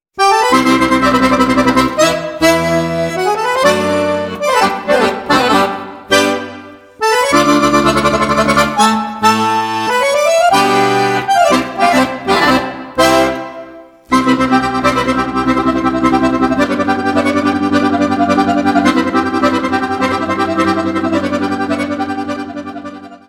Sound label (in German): virituoses akkordeon